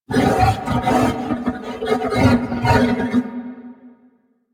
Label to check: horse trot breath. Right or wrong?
Right